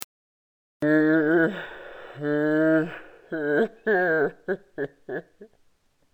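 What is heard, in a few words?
hippopotamus voice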